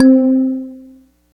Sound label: check off; boom 0524 edit